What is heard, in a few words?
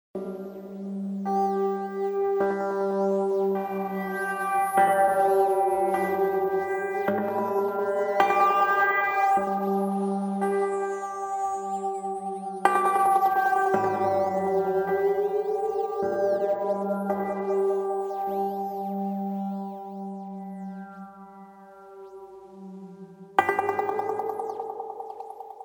Piano On The Intercom